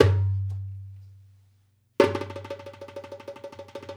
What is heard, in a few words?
Dumbek; Essential Percussion